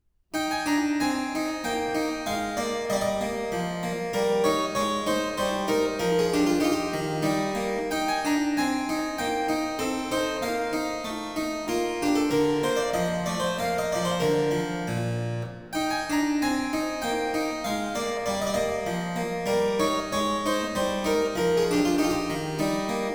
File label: French Suite, for keyboard in E major, Polonaise; BWV 817 (BC L24); Bach